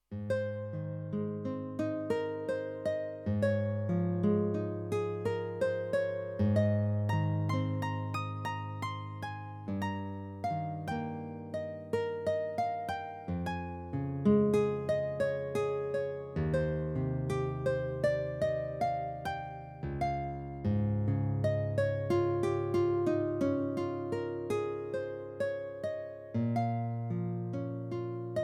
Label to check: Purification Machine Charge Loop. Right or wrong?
Wrong